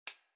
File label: outdoors clap